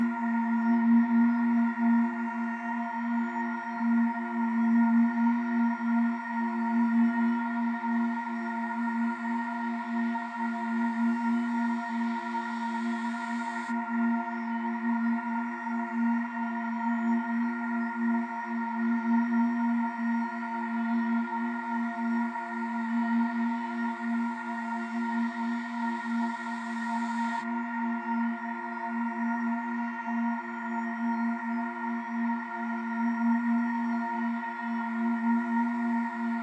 140 BPM A# Min Ambience; Melody; Reverse; Loop Stems; Cymatics; 2022 Melody Collection; Eternity

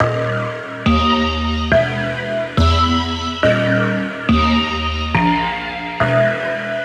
Riddim Synth Loop D# (140); Roach; Ghosthack; Riddim Essentials 2020

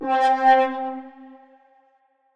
LEAD Darted (C), One Shots; Cymatics; Mystery Pack Vol 4

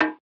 Vintage Percussion Degraded Tone; Cymatics; Mystery Pack Vol 4

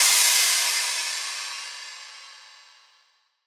Crash; Hats and Cymbals

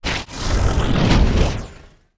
wooshes transform sweetener

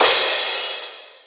Crash, Dubstep Cymbals; One-Shots; Drums; Petrichor; Ghosthack; Ghosthack - AC2024 - Day 13 - Dubstep Sounds 2025

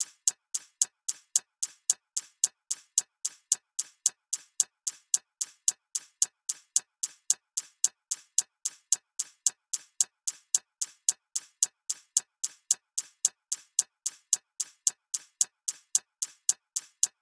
Drum Loop, 111 BPM Hihat, RnB; Loop Stems; Forever

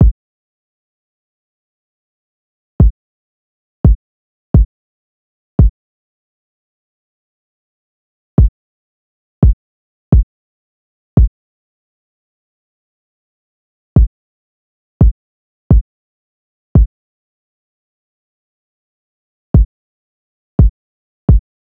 Drum Loop - 86 BPM Kick; Loop Stems; Cymatics; DOPE DRUMS VOL. 1; Sky

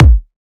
Riddim Kick

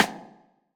Percussion, House Drum One-Shots

